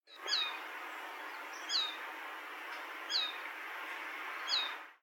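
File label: tumi male, Japanese sparrowhawk; saitama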